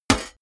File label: metal hit clank light